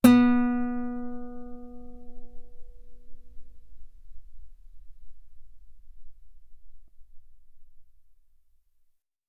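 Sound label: Guitar ff B string B3; OmniPower4296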